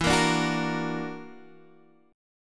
Gb Augmented 7th E

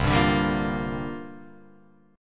C 9th Suspended 4th, Guitar Open F, chord; C9sus4 strummed; JGuitar

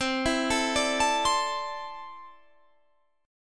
C 6th Add 9th C

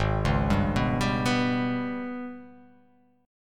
G sharp Minor Major 7th Double Flat 5th; 4,4,5,4,7,6,x,x

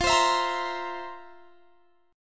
F Major 7th Suspended 4th; strummed

